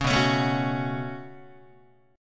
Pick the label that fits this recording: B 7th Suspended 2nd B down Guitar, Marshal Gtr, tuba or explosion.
B 7th Suspended 2nd B down Guitar